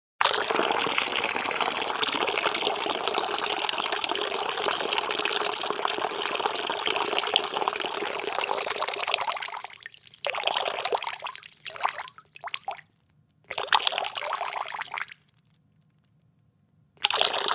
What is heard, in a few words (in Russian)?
zvuk pisaniya v unitaz